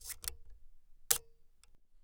ARMEMENT DECLENCHEMENT LENT KINAX JUNIOR

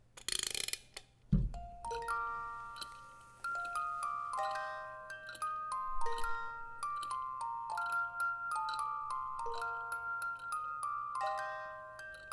BOLS BALLERINA

